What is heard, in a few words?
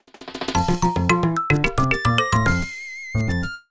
Forest Checkpoint